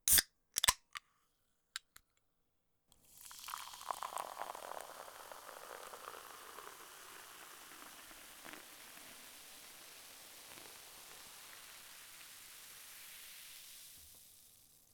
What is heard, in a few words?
pour